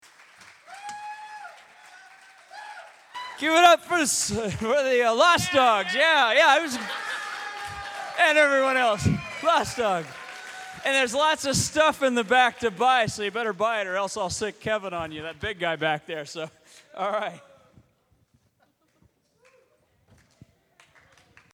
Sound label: Applause; dogs